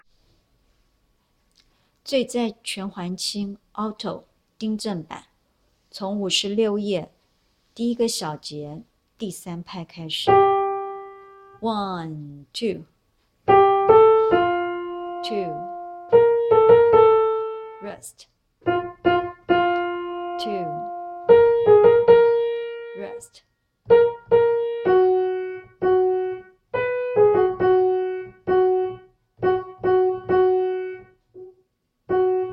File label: Alto